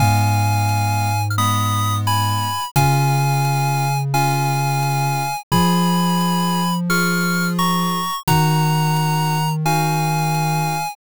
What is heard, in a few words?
bgm mellow